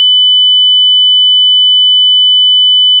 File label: sin 3000Hz -3dBFS